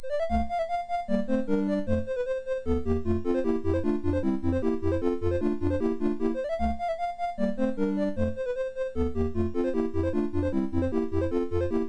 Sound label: Season Jingles, MOS6581R3